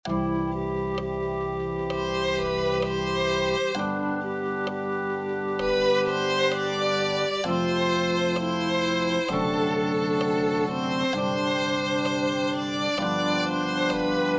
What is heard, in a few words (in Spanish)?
santo porque es glorioso violin